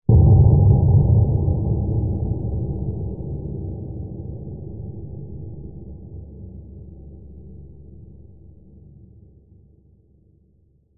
hit impact dark deep metallic atmospheric low pitched; sound design